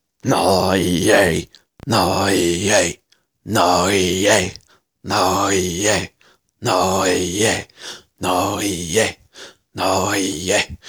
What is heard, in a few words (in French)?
mantra